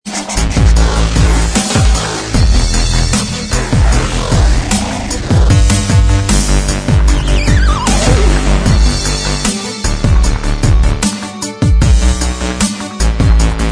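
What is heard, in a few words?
dubstep remix, instrumental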